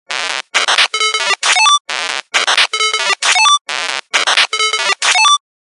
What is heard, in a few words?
8bit; RingTones